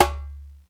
Djembe Slap

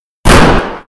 Magnum; Remixed

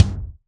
Dnb kick

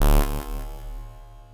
Atari Stab D

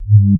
Boink

